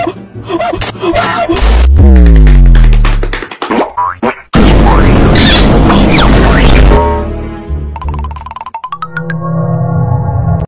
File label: goofy ahh sounds; tiktok